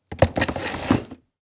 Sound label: Objects office cardboard box take an object out